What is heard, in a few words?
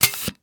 quick Stop; narcos